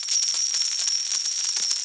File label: big Win Coin Rain Loop; imperialriches